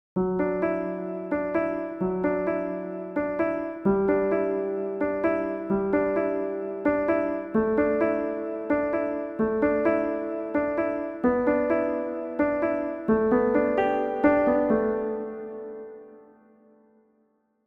piano; fl soundfont player

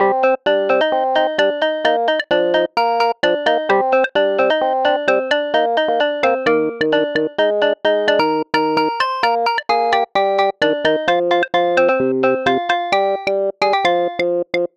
Phone Line Ringtone; AT&T Phone Line; 4th Of July Version

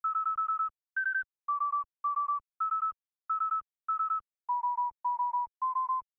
SOUND WAVE; Unused Version; byond FEED

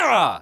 pizza hit; roblox

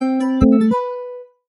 Chore Started Lower

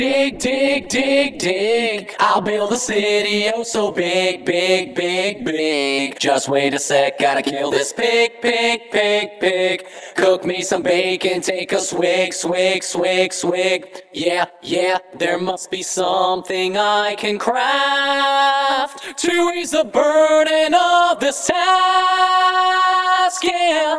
Vocals

